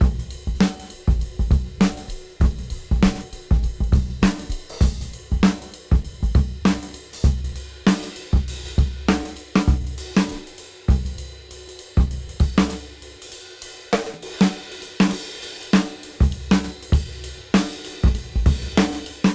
Beat 98 BPM Swing; AMG - Keith LeBlanc Phat Butt Jams

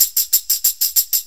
6-8 Tamb, Perc Loops

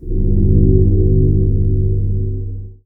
BUDDHA GONG; Best Service Dream Experience; SF+EFFECTS

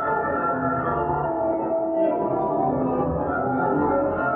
Tonal Re Draw, sparse soundscape

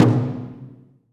SMALL DRUM, JAPANESE

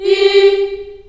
G3, Choir, CHILD; CHILD IHS

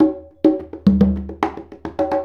Congas; Essential Percussion

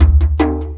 Dumbek Fast, Ethnic Percussion; Sonic Foundry (Sony Creative Software); Ambient Atmospheres & Rhythms

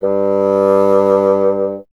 WND BSN G, Bassoon; Miroslav Vitous - Solo Instruments VOL-1

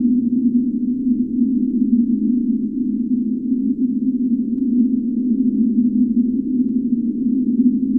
Drone Ambient; Drums & Drones

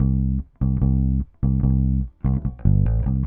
Bass, Indie Rock; Sonic Foundry (Sony Creative Software); Indie Rock Anthems; Session 11 - Silos

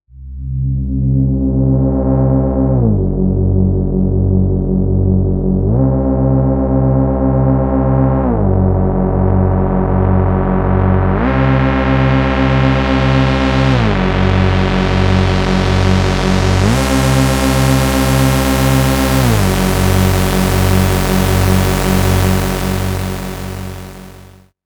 Lead; neuro; InsideInfo

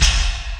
CRAK SD, Industrial; USB Soundscan vol.24; AKAI

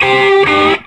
SOLO, Vintage Blues Guitar

